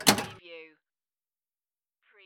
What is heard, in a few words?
Pinball sound, clunk